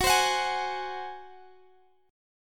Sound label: F sharp Suspended 2nd Flat 5th, Guitar, Open G